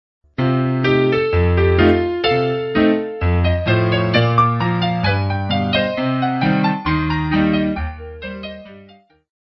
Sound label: Palm Leaf Rag